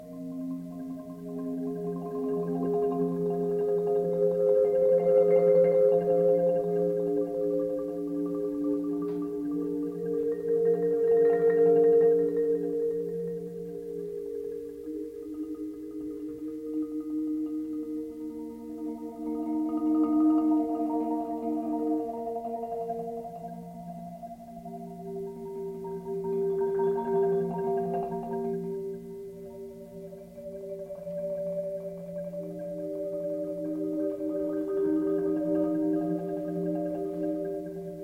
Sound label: marimbas